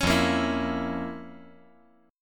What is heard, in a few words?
F Major 7th Suspended 4th Sharp 5th F